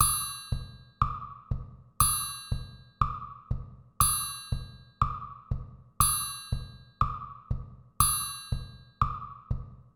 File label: deep duple duple metre dots; organisation of time metre